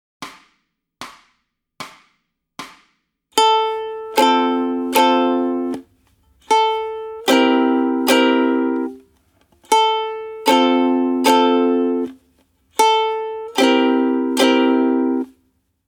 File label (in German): Ton A Warm-up mit Akkorden, ukulele